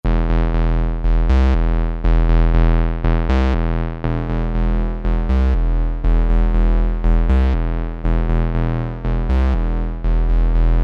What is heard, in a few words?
JX3P PEDAL; ROLAND; boss